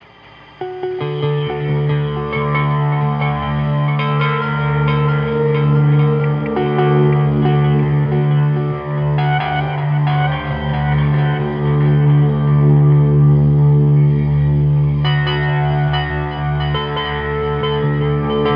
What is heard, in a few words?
Cascading Delay Guitar Effect lower filter and higher gain; cascading delay guitar effect garageband